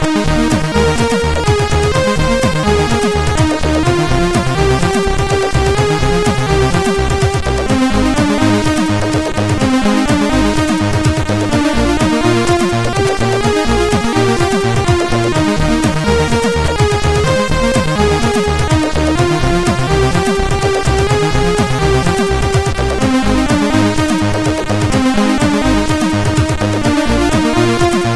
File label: Outrun sid CSG8580R5